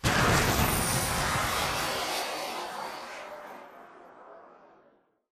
boost glide body; Titanfall 2

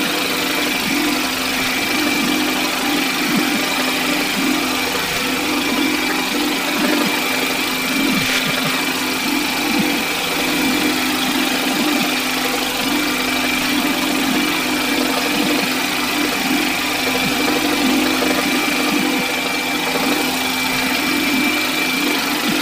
Worker Drone Hover; Titanfall 2

